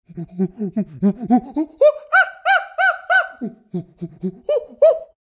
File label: Monkey Win; Pitch Black Audio Pong